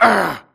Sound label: grunt hits; Titanfall 2